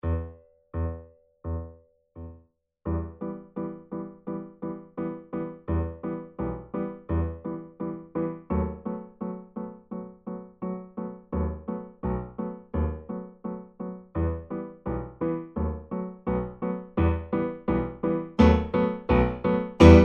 Secondo, Vierhaendige Uebungsstuecke; Diabelli

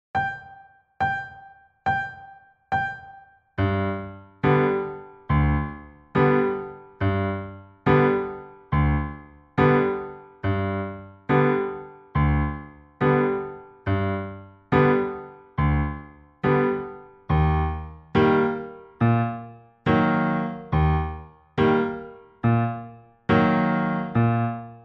Zauberklavier 70 Secondo; Uebungs-CDs; Playbacks

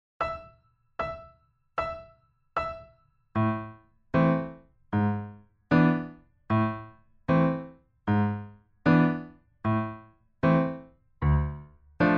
Slawischer Tanz Langsam Secondo; vierhd Uebungsstuecke

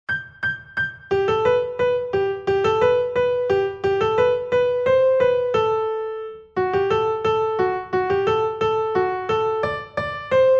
Zauberklavier 176 Primo; Uebungs-CDs; Playbacks; Polly Wolly Doodle

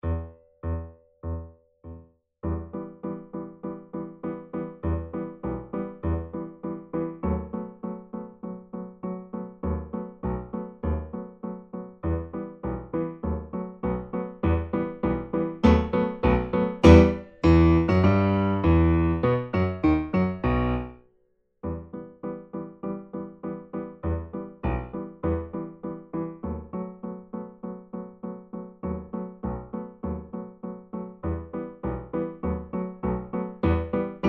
Secondo, Vierhaendige Uebungsstuecke; Playbacks; Diabelli

